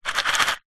household box card small safety pins shake lid off